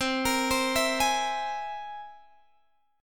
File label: C Augmented 7th, Guitar, Open G